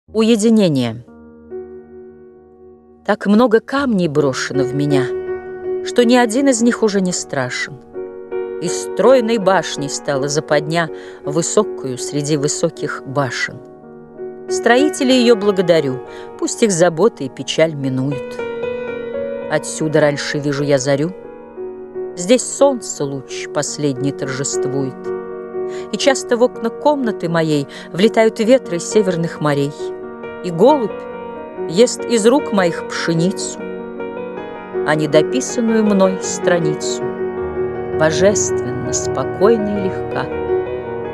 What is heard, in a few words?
Cinematic Dramatic Sad Melancholi